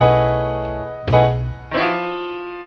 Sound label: piano, Phonky loops